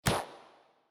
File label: ambience room secret room wisp smash